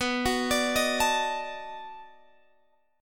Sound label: B 7th Sharp 9th, down, Guitar, Open G